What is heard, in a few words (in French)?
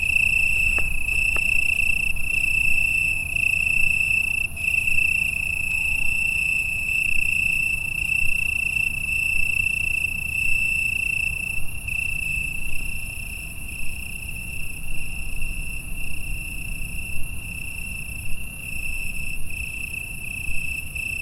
Grillons Italie concerto; 21h